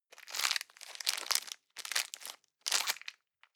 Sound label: plastic bag small pocket stick in hard